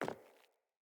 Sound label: Sandals Land Default, Footsteps; Player